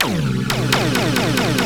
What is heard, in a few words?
a#1, Special FX, CASIO FZ-1; Retro Sampler